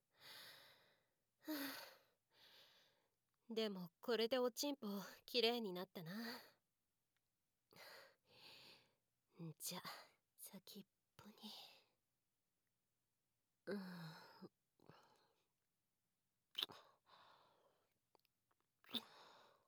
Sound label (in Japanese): 陰茎舐め